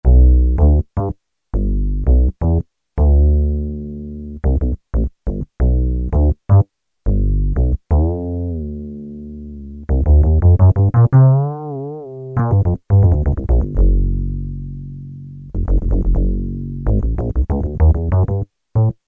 FRETLESS BS, Casio VZ-10M; Internal factory combi

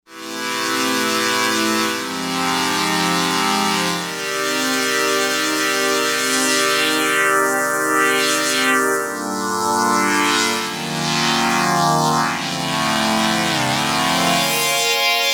Chord OB, Novation UltraNova; Factory Patches

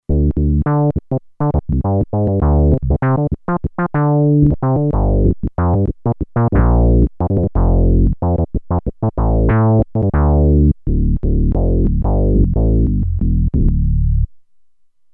Fuzzy Bass, Roland Alpha Juno-2; Factory 2

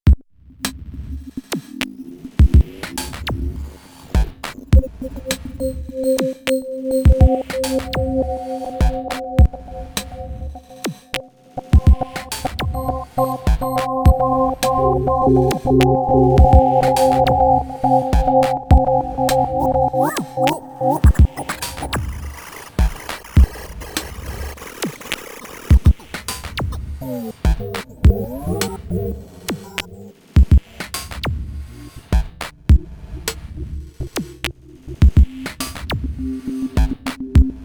Korg Radias-R

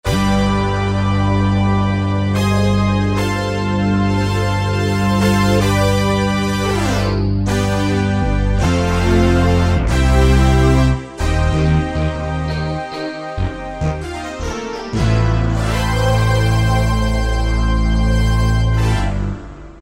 Hybrid Split, Kurzweil K2000; Kurzweil K2000 Contemporary ROM

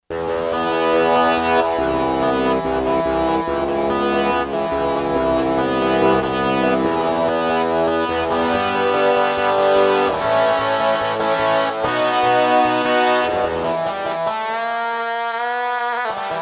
Rock Gt, Korg DSS-1; Guitars (Rock)

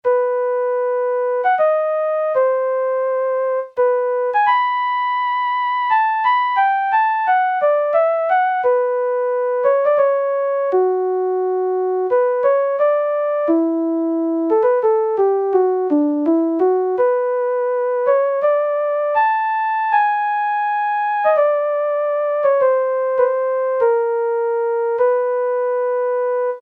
FLUTE, Yamaha SHS-10

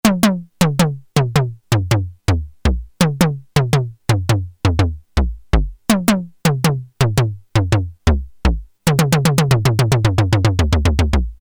Tom-Toms, Prophet-5; Sequential Circuits Prophet-5 (Ver.3); Factory Patch; File 3 Programs

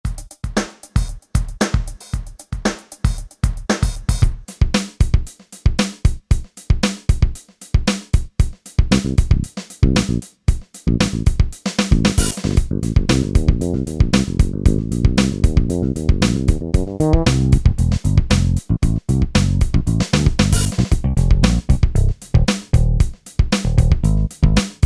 ROCKIN MIX, grooves

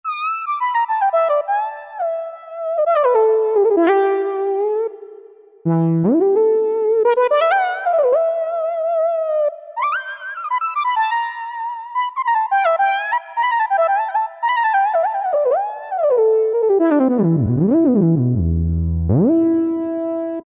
Oxygen, Synth & Fx; Factory Voices

